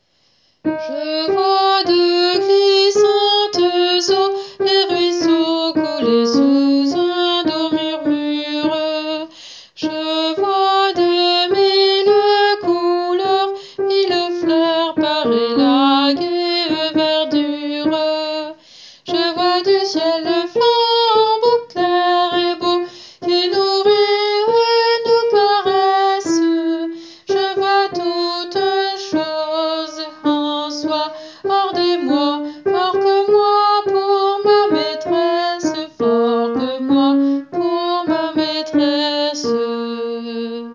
je vois de glissantes eaux alto

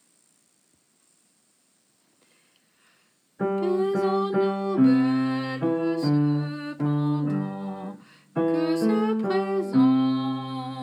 basse; baisons nous belle; Adrian Leroy; Second livre de chansons Leroy & Ballard1564

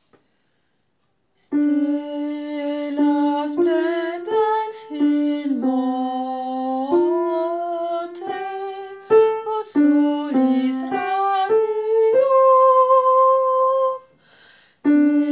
basse; Stella splendens; Agde; Anonyme (XIIIe ou XIVe)

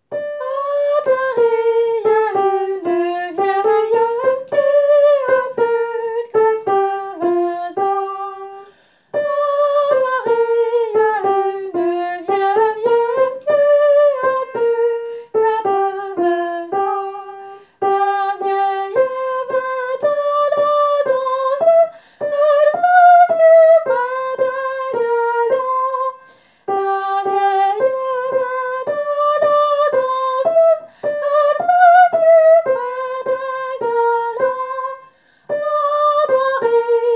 vieille soprano

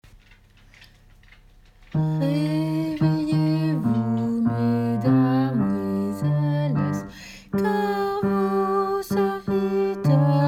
Reveillez vous. Basse.; Pierre Sandrin – 1547 22ème livre Attaingnant; Reveillez vous mes damoyselles

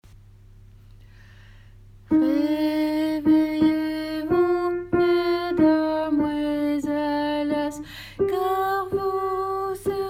Reveillez vous. Alto.; Pierre Sandrin – 1547 22ème livre Attaingnant; Reveillez vous mes damoyselles

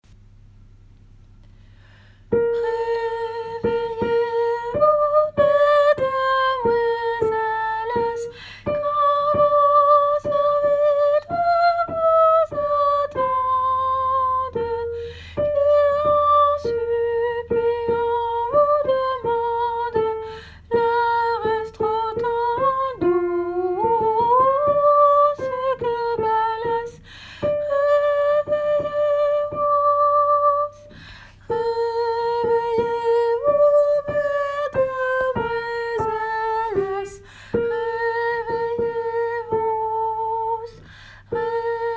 Reveillez vous. Tenor.; Pierre Sandrin – 1547 22ème livre Attaingnant; Reveillez vous mes damoyselles

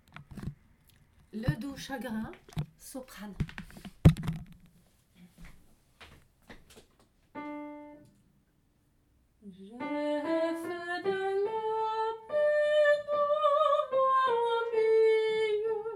le doux chagrin sop

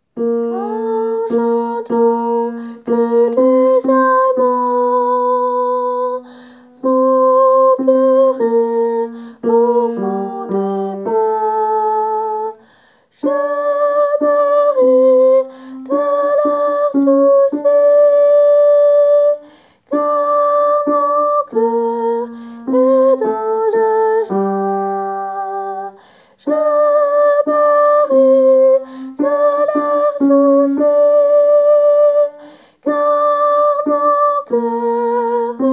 ténor; Quand j'entends

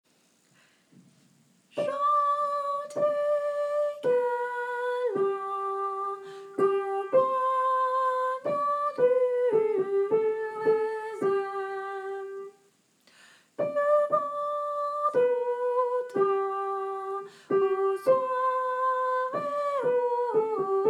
gentilz gallans tenor; Anonyme – London 1070, pp 266-267

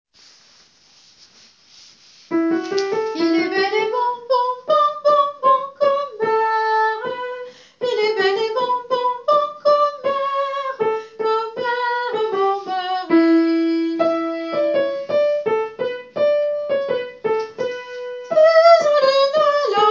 ténor; Bel et bon

